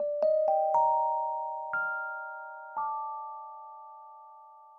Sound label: loop vibra negro